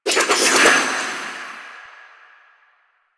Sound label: Kunai Reload; Warframe; Weapons; Choir